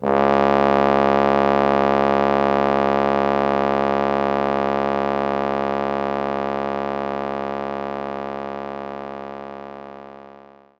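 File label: Trombone C#1; knights try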